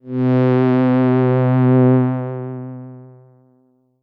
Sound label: Korg DS 8 Strings C4